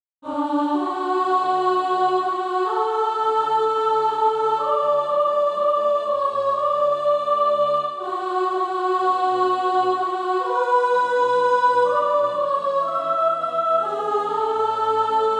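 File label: soprano, Practise Music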